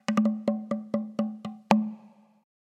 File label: sms drum; Mudita Pure